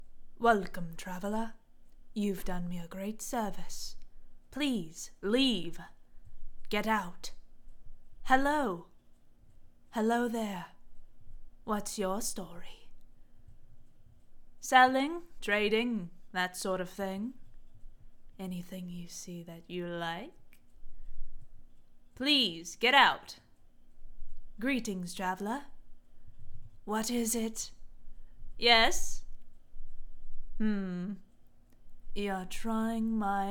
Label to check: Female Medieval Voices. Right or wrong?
Right